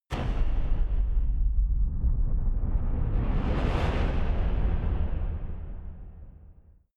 massive metal rumble

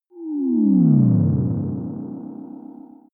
teleport beam, Energie

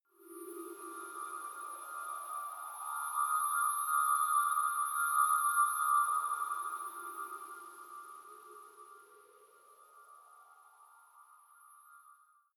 space chiming wind; weltraum

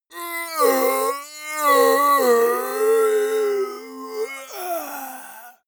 monster die; kreaturen

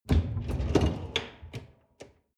folding door open

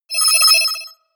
computer sound, signale; soundpool

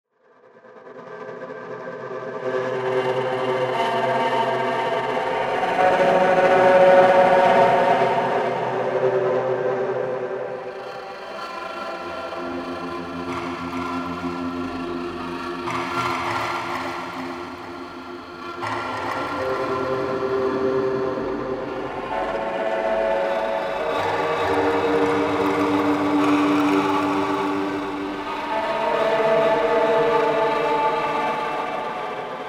stuttering horror drone

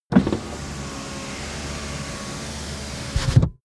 car window front close full

